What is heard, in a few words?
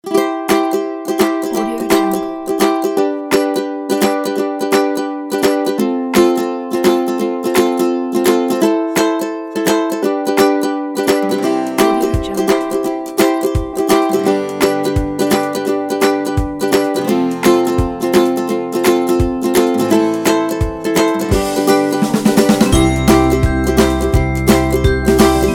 happy